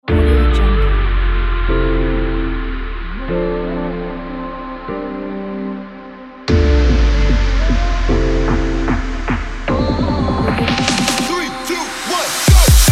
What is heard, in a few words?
future bass; pulsar-sound